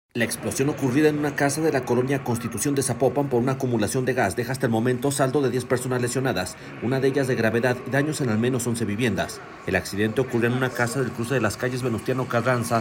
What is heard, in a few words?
explosion